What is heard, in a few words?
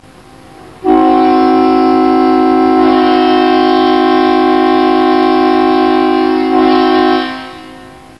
horn